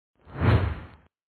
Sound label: low woosh